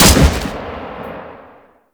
Gun; Warframe; Jackal

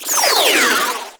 Sentient Marrowtick Pod Incoming; Warframe; Tau

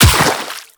Run Water, Footsteps; Warframe; The New War; Characters; Kahl

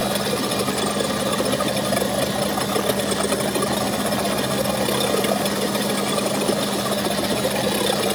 Scanner Spin Loop; Entrati; Swarm

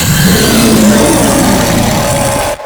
Proto Infested Ancient Taunt Scream; Warframe; Abilities

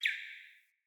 Cardinal Male Bird Single Chirp

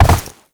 land snow; Movement; Warframe; Uriel

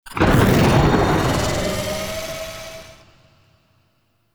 Corruption UI Fracture Open; Warframe; Zariman; Missions

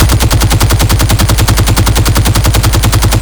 Heavy Archgun Fire Loop; Warframe; Tau; Tau Dax; Weapons

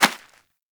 Rodent Hop Step Rear Dirt, Footsteps; Warframe; Characters

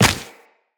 Run Dirt, Footsteps; Tenno

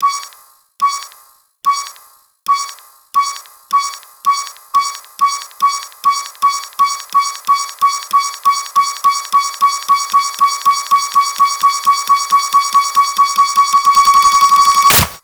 Delivery Key Holder Loop; Warframe; Nineteeen Ninety Nine; PvPvE

